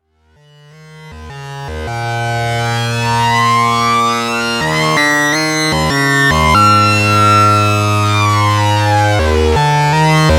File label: Oberheim OB8 unisono